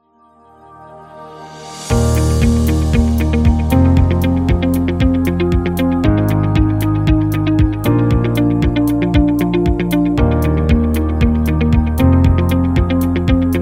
A Corporate; service business

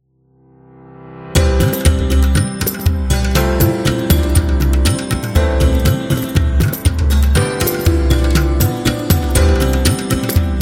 India Upbeat

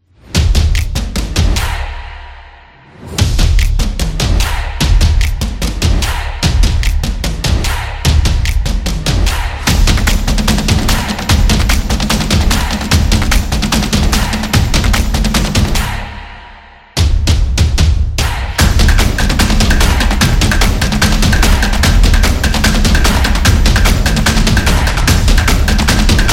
Sport Drum; samba

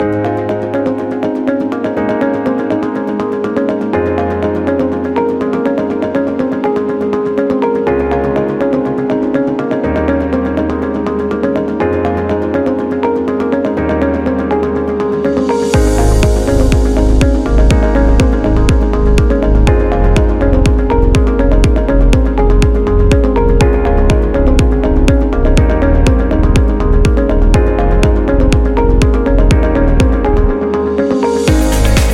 Technology Background

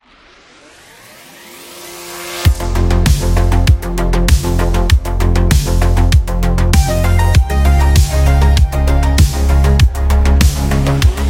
Retrowave

